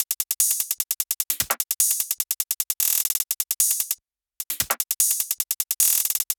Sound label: Future Bass Kit 150bpm Top, drums; EDM Drums; Drum Kits; Stems; Ghosthack; Ultimate EDM Drums; Drum Mega Bundle; Future Bass Kit 17